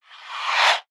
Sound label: Ice Oneshot; Spinjitsu